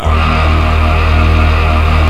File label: low monovox; Rubber Chicken Software Sample Bank